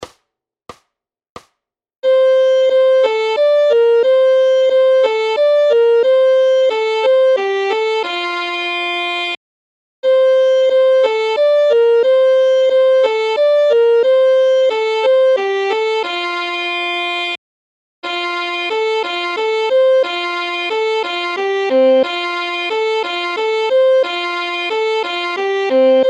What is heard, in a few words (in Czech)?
Nesem vam noviny, houslekoledy